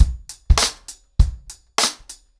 100BPM, ACOUSTIC DRUMS; The Roots Drumloops

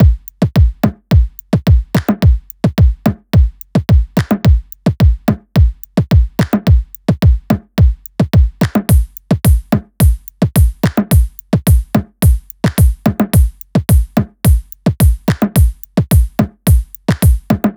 loop full 108; Seismic Loops

